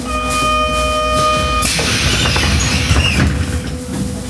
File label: closing doors, tram